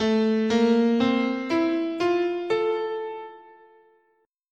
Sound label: A Balinese Pelog